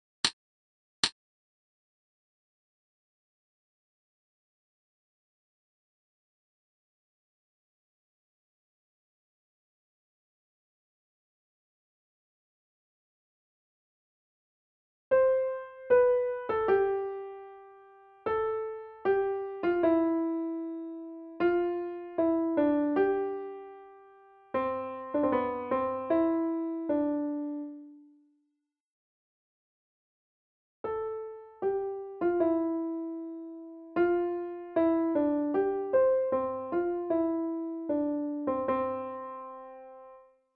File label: caro mio ben melody